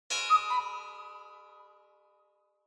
Cuckoo Clock Bell Reverb Short

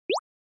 Anime Motion High

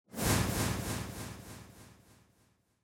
Camera Motion Short Delay; カメラモーション; 短 ディレイ

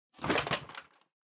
Vacuum Cleaner Pick Up; 掃除機; 持ち上げる

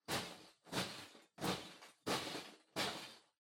Loose Fill Packaging Far Rustle; 梱包材

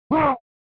Fighting Shout; SNES

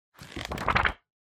Magazine Flick Through

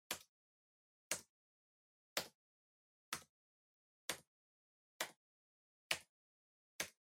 PC Keyboard Far Single Mid

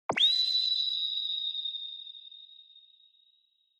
Flash Low Long; Onoma; オノマトペ 光る; 低 長

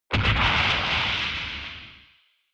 Retro Anime Robot High Long

